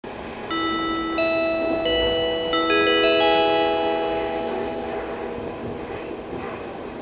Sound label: chime; odakyudentetsu